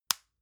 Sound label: lotion lid close medium; berufe